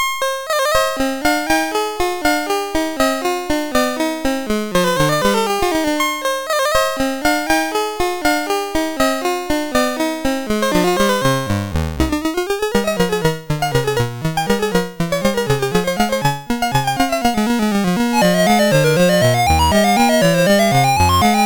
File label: Partita 2 Rondeau sid MOS6581R4; hvsc